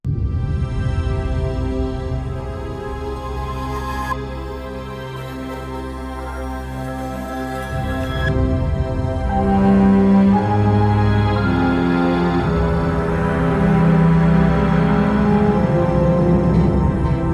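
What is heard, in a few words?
background music map; Iron Marines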